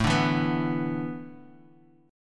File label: E 7th Suspended 4th A, guitar